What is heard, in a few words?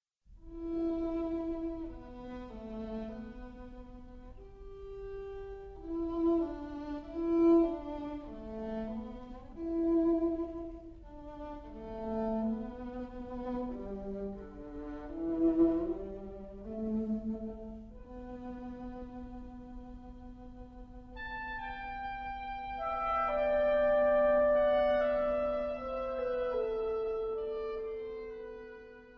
Suite for Oboe, Clarinet & Viola Adagio Assai